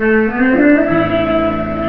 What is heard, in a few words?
SYNTH